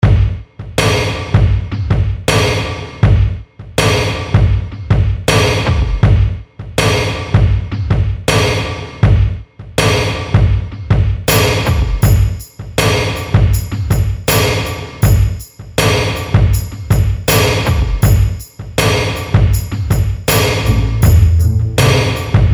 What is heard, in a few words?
Drum Bumm